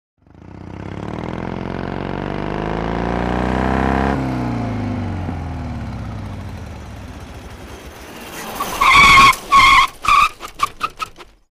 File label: Stop Off Harley Davidson